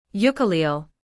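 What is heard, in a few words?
ukelele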